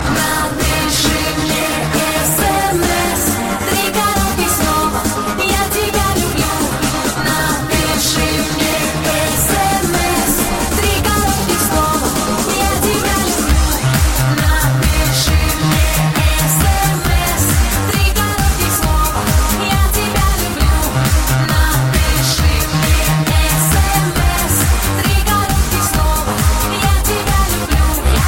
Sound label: russian sms; ringtone